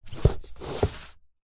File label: book slide in out bookshelf